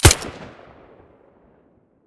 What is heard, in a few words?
silencer fiveseven stereo, weapons